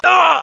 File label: MINOR PAIN; cash